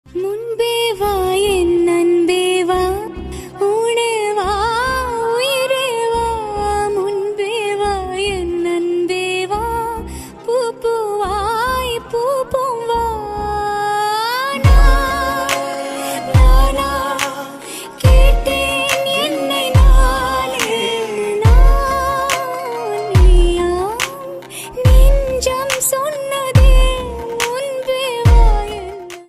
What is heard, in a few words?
song remix voice cover ringtone